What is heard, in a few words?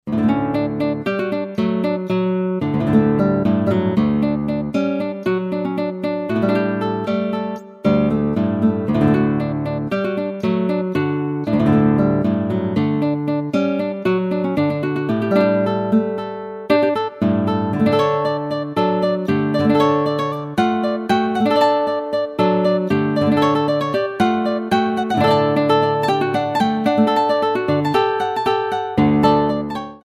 Pobblebonk Dance; solo instrumental music